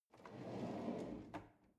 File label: drawer cutlery draw in